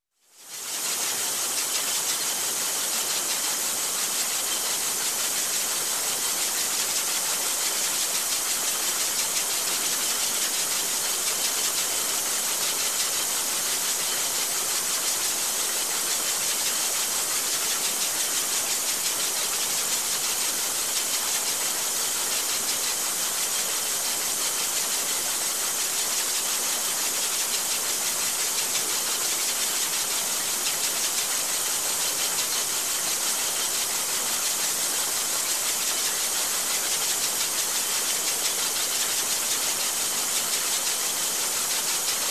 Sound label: Industrial machine running